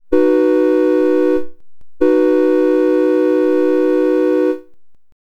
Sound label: Train Horn